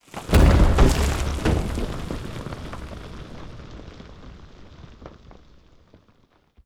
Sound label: Break Wall